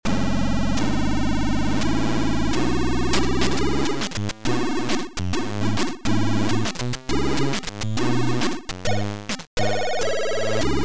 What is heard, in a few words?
Jazzy; Factor6